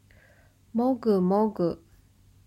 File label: mogumogu, Chewing sounds; モグモグ – mogu mogu; manga onomatopoeia sound effects